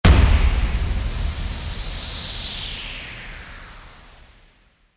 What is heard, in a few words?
IMPACT